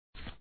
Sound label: drop ticket; papa s freezeria gamerip